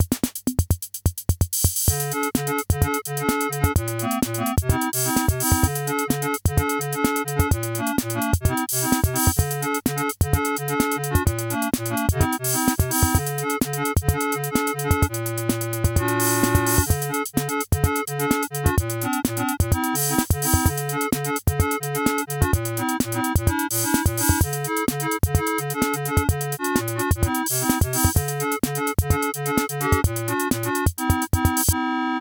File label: Casio Casiotone MT-800; Musical Showcase